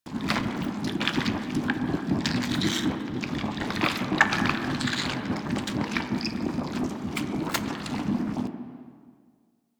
destructable cyst loop; Ambience; Props; Warframe; Infested Eris

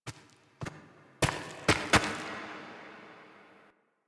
Mountain Pass Operator Foley Run; Cinematics; Teshin; Warframe; The War Within

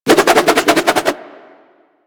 Nunchaku Quick Spin Whoosh; Warframe; Tenno

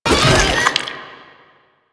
Proto Infested Runner Jump Whoosh; Warframe; Abilities

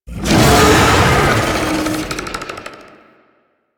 Tank Infested Vocal Attack Swipe; Enemies; Barks; Warframe; Lasrian

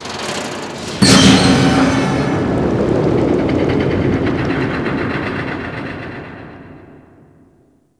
Ocean Metal Bubbles; Ambience; Warframe; Grineer Ocean

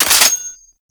Sniper Low Clip Mech; DE; Warframe; Frumentarius; Exalted Sniper

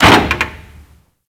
Derelict Mover Metal Clunk; Ambience; Props; Warframe; Orokin Derelict

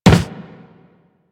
Run Wood, Footsteps; Warframe; Operator

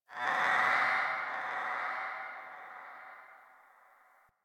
Chatter Painful Scream; Ambience; Misc Outdoor; Warframe; Infested Eris

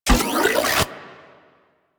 Charge Up C; Warframe; Weapons; Corpus; Vandal

